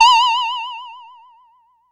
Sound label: piu